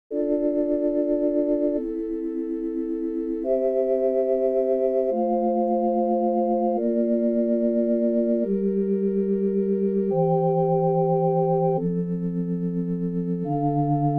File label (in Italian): Choral a quattro voci; Esercizi di contrappunto; Ronge pagina 69